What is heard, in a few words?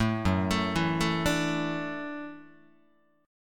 Gb Minor 7th Sharp 5th, Guitar; arpeggiated; JGuitar